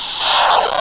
Cloud Lightning; Lightning from a cloud; zelda

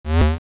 Web Bounce

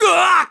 Hurt Bad; getting hurt badly; Link; Skyward Sword

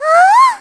Gasp; Tetra gasping at Link; zelda sounds

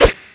Boomerang Throw; Throwing the Boomerang; Toon Link; Zelda

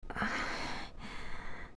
Young Link Hot; Link moaning in the heat (3 parts)

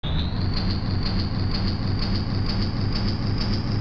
Cage Door Start; Fire Temple; Zelda; OOT

